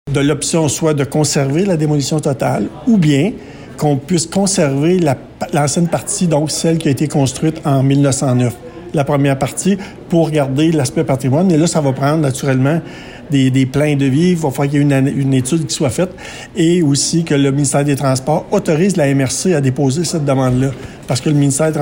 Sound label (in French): BOURDON; DECISION